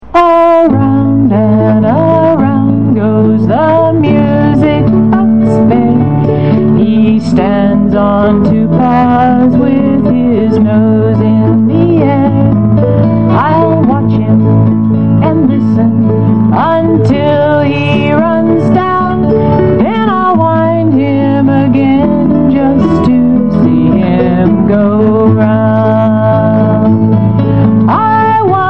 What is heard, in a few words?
music box bear